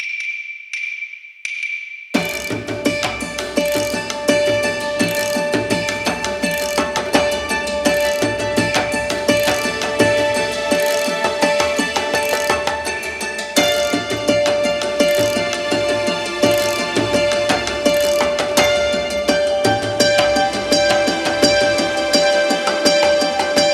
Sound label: Ambient Day; Eidolon; Cetus